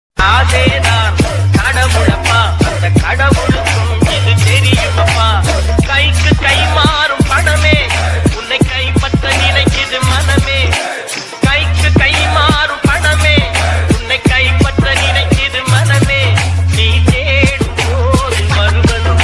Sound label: tamil ringtone, old song ringtone, remix song